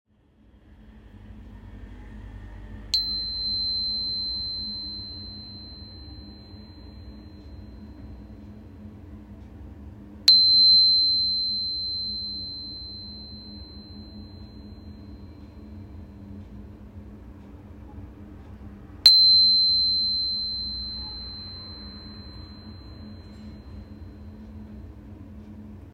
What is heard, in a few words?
golden lemurian tuner